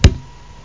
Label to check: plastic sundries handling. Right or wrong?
Wrong